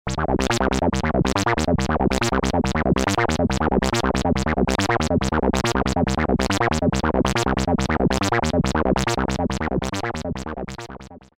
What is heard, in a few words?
Korg Monologue; Rubber Duck